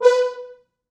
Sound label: Fr Horns stac